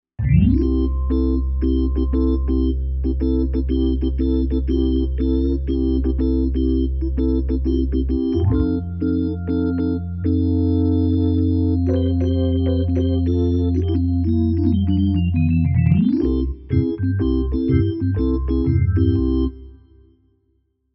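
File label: Organ Glass Swish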